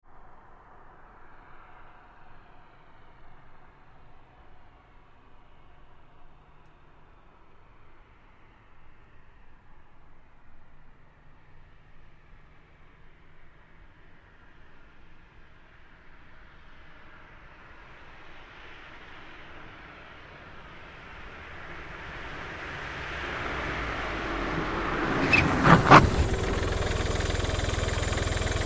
Car Smart Car Up Stop Fast; 2005 Smartcar Fortwo; Vehicles

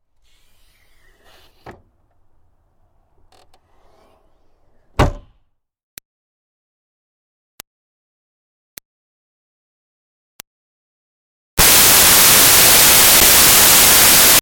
Car Smart Car Hatch Close; 2005 Smartcar Fortwo; Vehicles

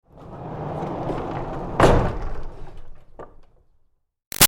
Door Barn Rolling Wood Bang; Barn Doors

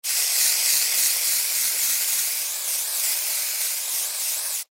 Aerosol Spray Liquid; Spraying Sound Effects; Objects; Foley

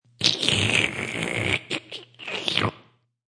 Slurp

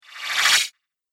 Whoosh Magic Swish; sound design

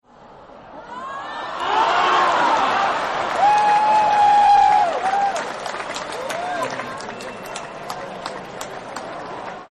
Traveling Cheers, Crowd; Crowd Sound Effects

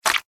Horror Squish; Monster Sound Effects; Monsters (Sound Effects:Horror)

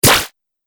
Laser Zap, Laser Gun

